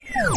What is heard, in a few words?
pickup powerdown; subway